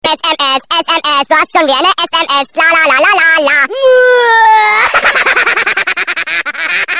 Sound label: lalala funny sms ringtone